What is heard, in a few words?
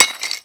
Pick Hit, Shatter Stone; NV3D